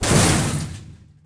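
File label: metal debris hit medium; objects; Star Wars Obi-Wan; XBOX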